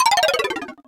Pipe Disappear; SM3DW

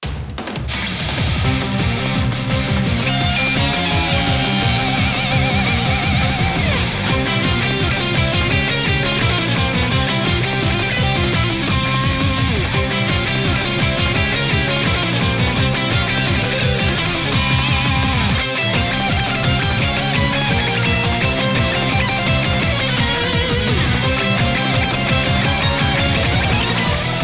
Arrangement; Grumble Volcano

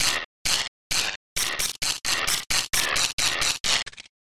Erase Split Screen; Mario Paint

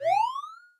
Door Key Get

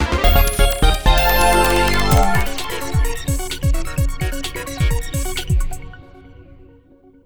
Completed Puzzle 2; Club Nintendo Picross

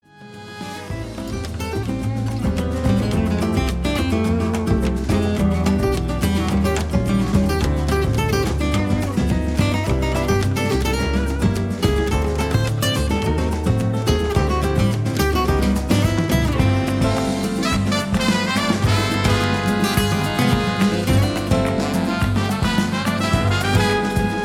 Main Theme Latin Arrangement; SM64

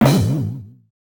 Ground Pound Attack; NSMB

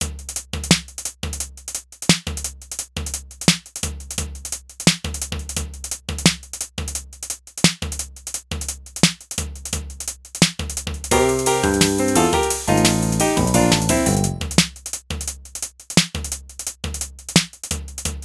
Music Minigames Game Explanation; NSMB

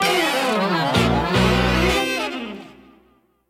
Battle Intro Enemy

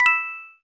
Coin; P&DSMBE

